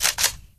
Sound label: shotgun reload